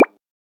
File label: plop; santalicious